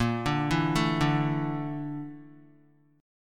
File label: A sharp Major 7th Flat 5th A sharp Guitar; A#M7b5 arpeggiated; JGuitar